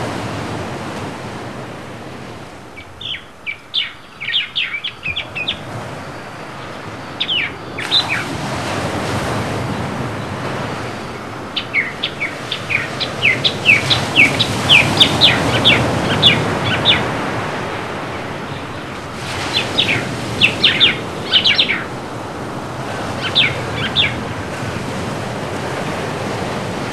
Caribbean Surf

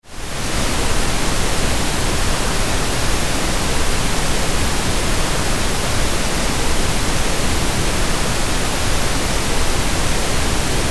pink noise; sound oasis

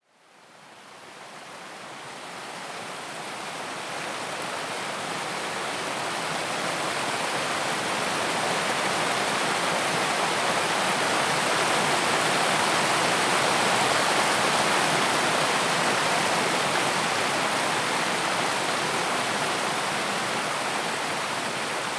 rocky mountain stream; sound oasis

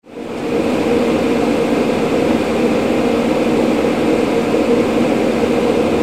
Air Conditioner; Sounds For Sleep

